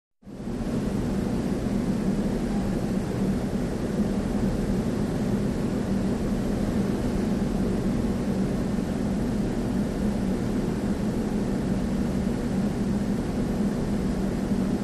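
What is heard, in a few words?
Dam Interior Turbines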